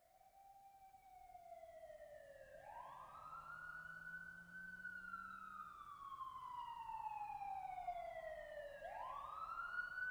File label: Ambulance By Medium, ambulance